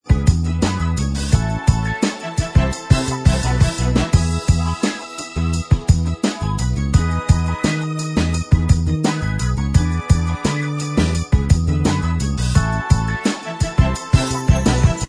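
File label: instrumental track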